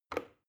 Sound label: plastic button start push regular